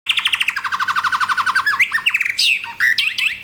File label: Recording, bird